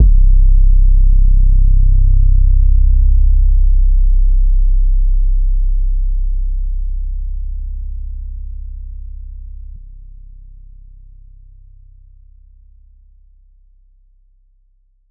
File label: 808 Oneshot Subzero C; Loop, WAV